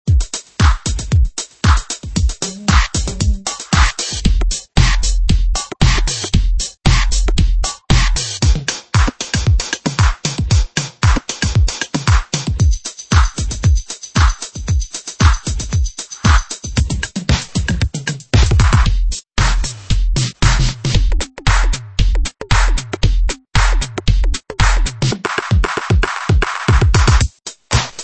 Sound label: Nu-Disco Drums; Noiiz